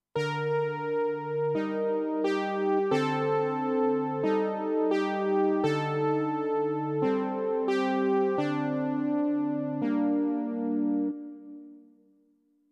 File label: Synth Brass